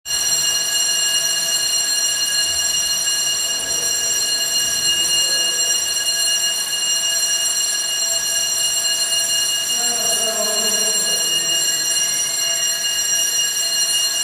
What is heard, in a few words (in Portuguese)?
campainha